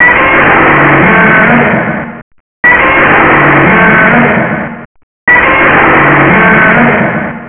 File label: Dinosaur